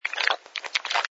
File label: slurp bottle